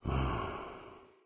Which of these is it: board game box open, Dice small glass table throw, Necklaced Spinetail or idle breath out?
idle breath out